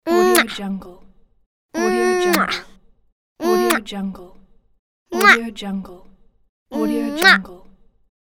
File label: little girl kiss